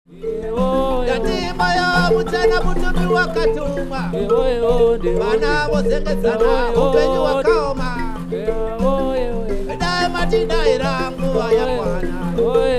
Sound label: mbira